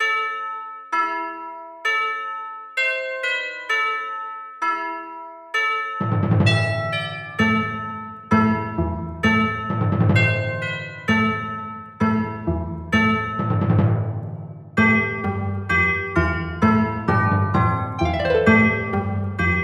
Winter Bells

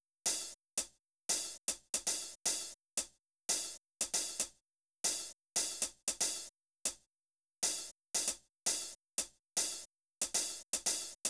drums

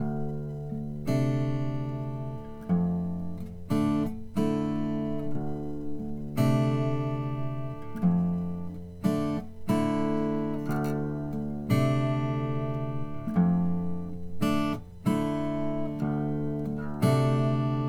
Dm 90 Acoustic Riff GTR dry

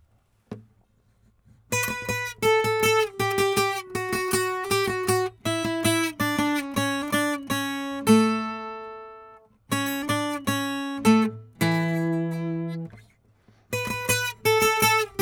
Strings on wood

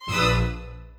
Scary Psycho Monster Bb2

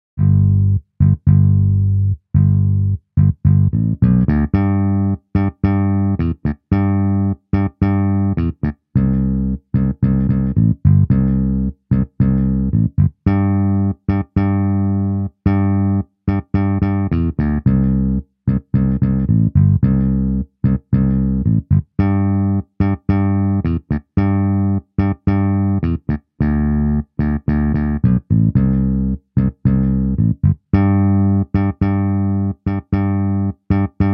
Slide Guitar 110 BPM In G

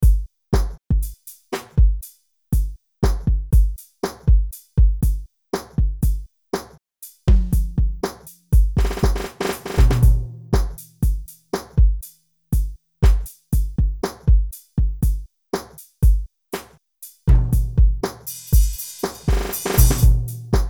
Dark Church

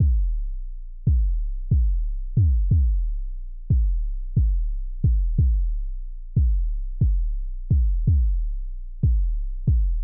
90 Kick

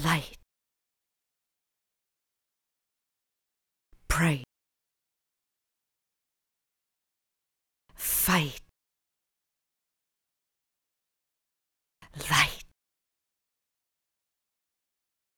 stems vox

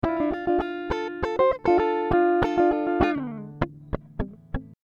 Dm 100 GTR Riffs

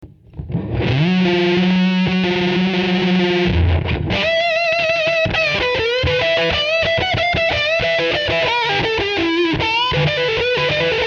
Warm Psychedelic Lead

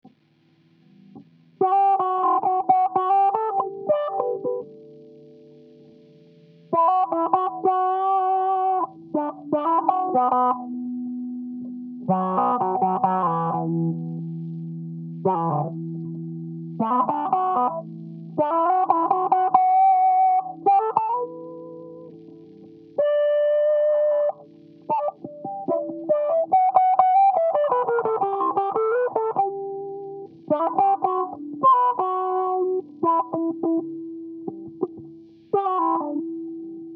Five Four Vinyl Blues

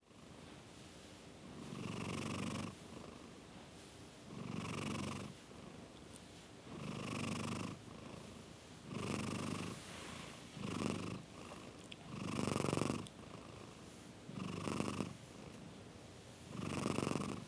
Purring Cat

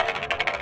contact perc

